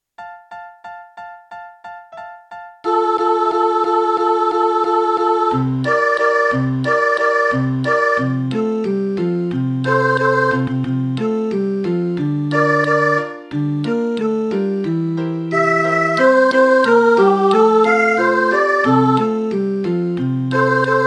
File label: jingle bells soprano